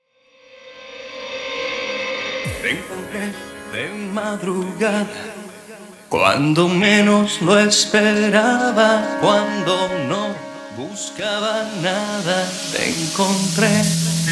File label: Acapella 68 BPM